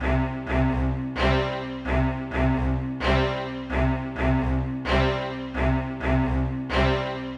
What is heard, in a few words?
130 C trance kit; Trance Explosion Vol1